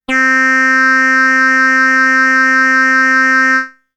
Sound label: Oboe A